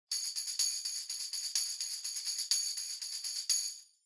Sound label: Tambourine Pattern